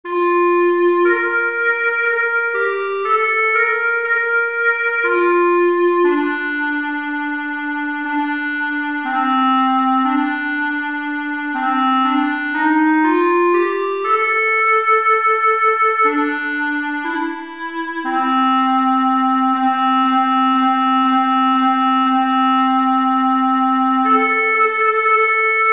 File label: clarinet